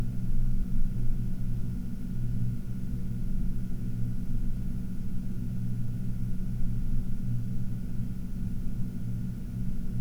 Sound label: 1000 RPM